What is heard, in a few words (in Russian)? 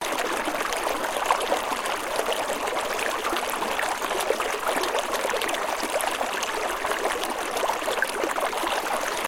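журчание воды в небольшом ручейке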